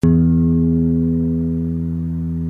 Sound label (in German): gitarre e6